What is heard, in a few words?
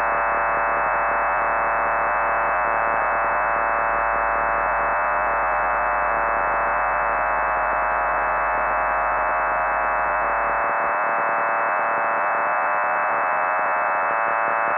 YUG DIPLO 16-TONE (PARALLEL); (phased out)